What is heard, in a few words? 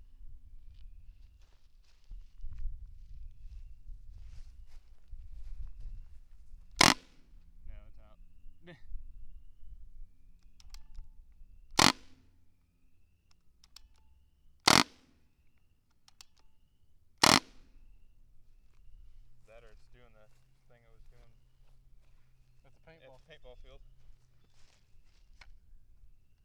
carbine 4 shot farts short barrel, guns; pro carbine; 68 carbine